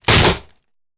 drywall crunch; prosoundfx

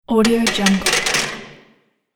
squat bar

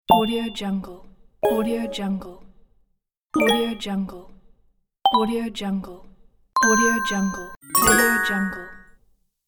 game coin pack

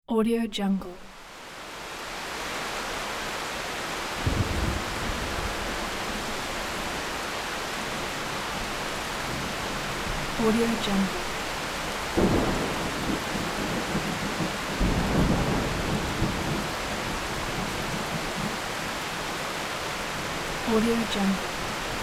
rain with thunder

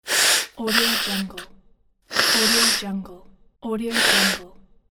human blowing nose; greenwaysound

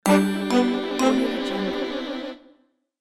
cartoon surprise suspense trill

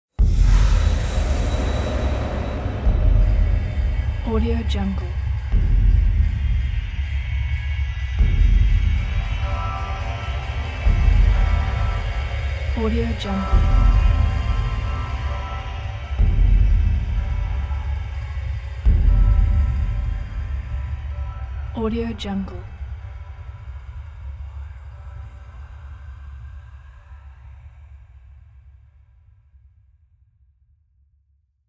percussive horror background

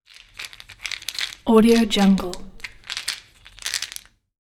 plastic bottle

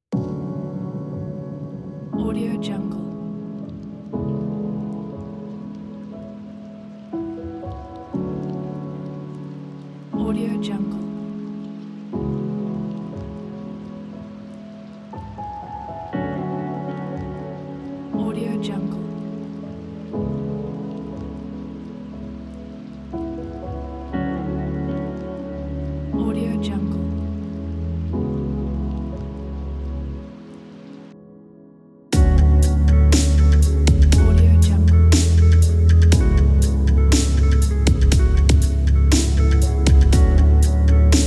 positive relaxing guitar piece

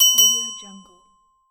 the ding ding

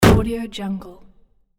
metal puncture clunk; hollywoodedge

